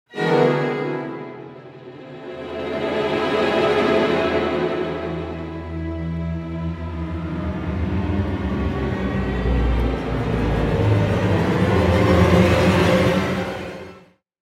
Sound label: apple eat cinematic tension build sting; Snow White